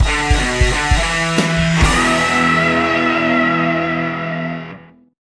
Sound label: level win; Tango Fiesta